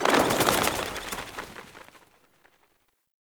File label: Birds Take Flight; Warframe; Ambience; Nineteen Ninety Nine